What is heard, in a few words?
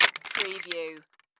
Debris Wood Drop